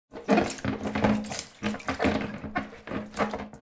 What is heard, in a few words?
Small bath air toy soft water splashes; foley